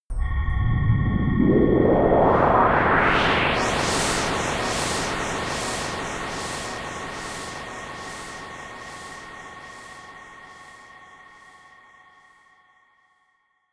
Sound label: space windy, Risers; whooshes rises